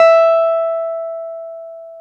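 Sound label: piano, Wurly; Roland LCDP10 Keys of the 60s and 70s 2; Wurly 200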